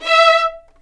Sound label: E5 VLNS ARCO DB; East West; The Ultimate String Collection